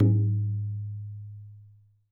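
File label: BANYA right; WorldSounds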